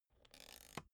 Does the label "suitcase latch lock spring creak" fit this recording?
Yes